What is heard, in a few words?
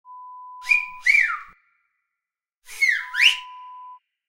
wolf whistle sound